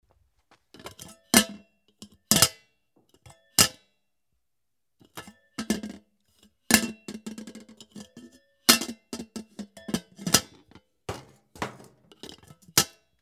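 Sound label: yakan kettle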